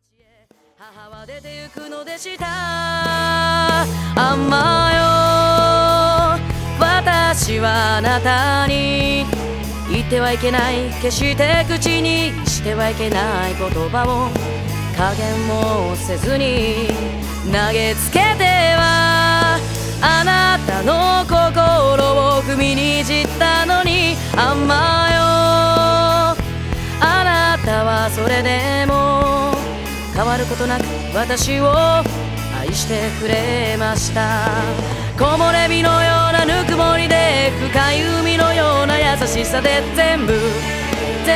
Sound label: music